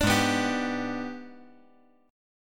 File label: Ab Minor Major 7th Flat 5th, down